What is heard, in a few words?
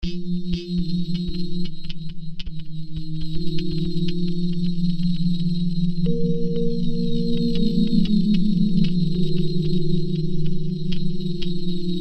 melodie suite